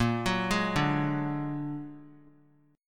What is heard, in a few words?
Bb Augmented 7th Bb down 3C,3F,3A,3D; Bb+7; arpeggiated; JGuitar